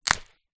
seed pod snap break flamboyant tree; foley

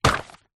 household magazine glossy drop carpet

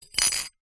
fork metal movement in bowl; household; zapsplat

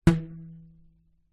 foley elastic band ping

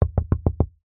vehicles car window knocks hand internal pov

